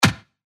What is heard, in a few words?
Snare; Champion; revealed claps snares vol 2